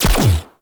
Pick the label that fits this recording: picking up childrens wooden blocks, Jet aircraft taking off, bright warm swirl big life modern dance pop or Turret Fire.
Turret Fire